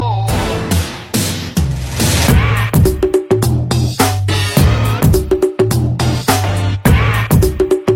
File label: Beat